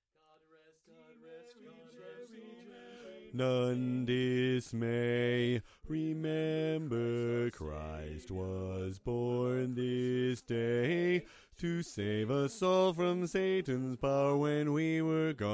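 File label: Bass, chorus; God Rest; christmas show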